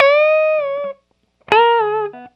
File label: 101 Pop Guitar Fill Db, electric; Pop Rock